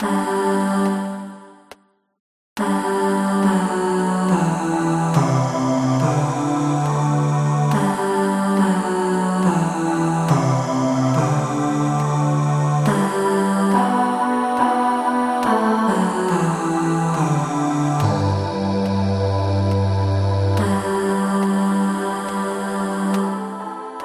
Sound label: Ave Maria Basse, acappella